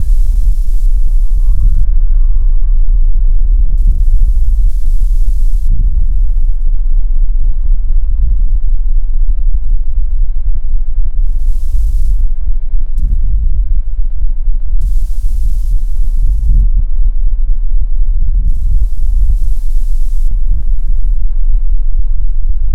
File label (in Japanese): 行為中不意に絶頂「~30Hz＋25Hz~40Hz」